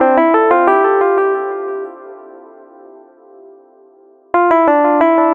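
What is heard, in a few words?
Happy electro piano, chillout; сэмплы клавишных чиллаут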